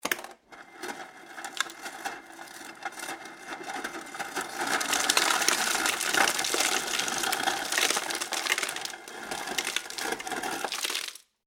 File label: Household Ice Maker Dispense Crushed Ice Into Plastic Cup; Appliance